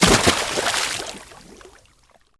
Fallwater

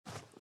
run sand; out of reach treasure royale 2020 gamerip pc

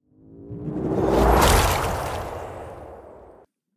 Sfx - Portal; Neon Souls; PS4 PS5 switch windows xbox one xbox series xs

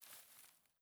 grass move

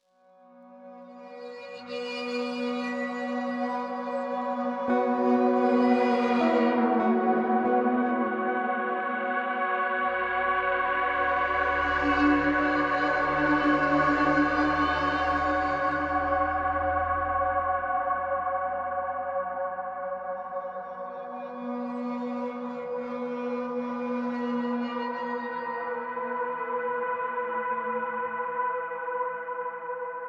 74 BPM; MetebelisIV

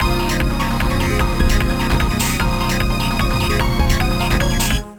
BGM STAGE BATTLE; Phantasy Star Zero

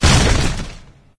Tornado Explosion; candy crush saga gamerip

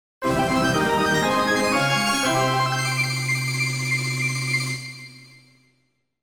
Battle Mode Win!; bomberman world

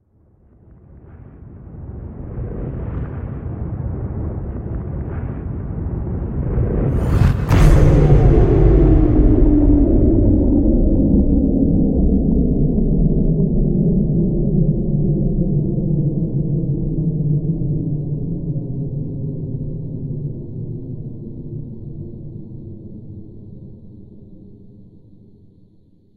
Power outage No alarm; criminality

